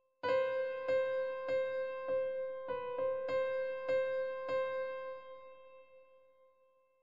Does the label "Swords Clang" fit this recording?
No